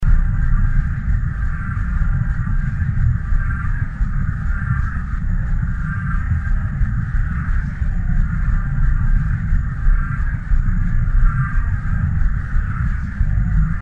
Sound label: Error unknown